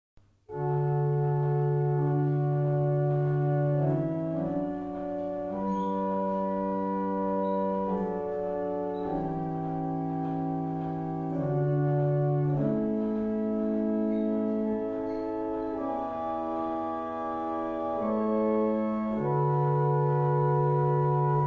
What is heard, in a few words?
barrel organs; Sutton Beds; Bedford